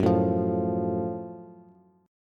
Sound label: Gb Minor Major 7th Gb down Bass; strummed; JGuitar